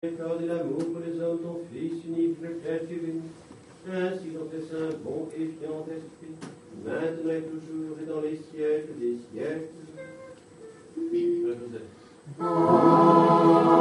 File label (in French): Amen solennel, divine liturgie orthodoxe; Priere de linclinaison